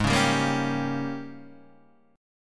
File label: Ab Major 7th Suspended 2nd Suspended 4th Ab, down, Guitar, Open D